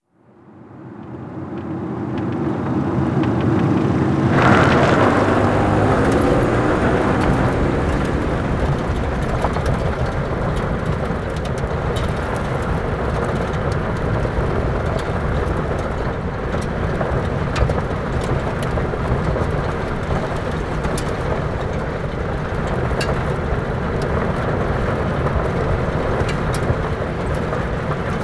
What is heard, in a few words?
car move; coup; Call of Duty Modern Warfare